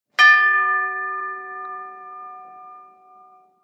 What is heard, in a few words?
Door Bell Tube Bell Ch